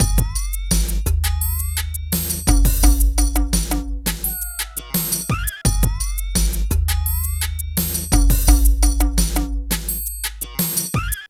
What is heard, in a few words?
PHATT BEA